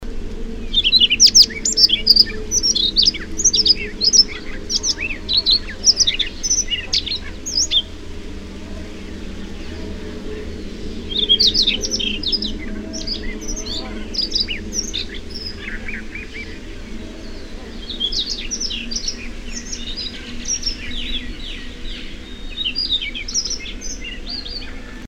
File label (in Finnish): luspec laulu; intia; tschebaiewi